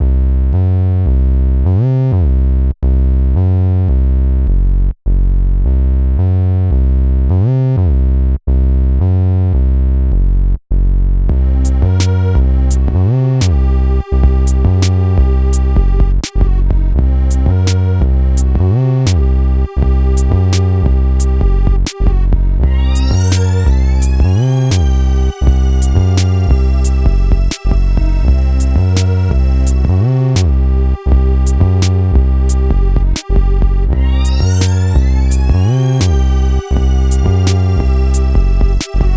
Gangstar C harmonic minor, A compton inpired beat made for the streets.; Thug Street; Karate Studios